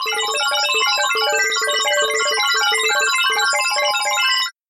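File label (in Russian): elektronnye zvuki peredachi dannykh, electronic data transmission sounds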